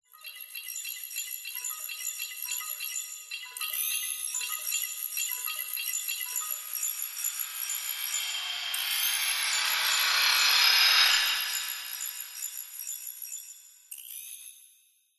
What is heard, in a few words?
futuristic technology factory ambience futuristic mobile app pick up sci fi mechanical; glitch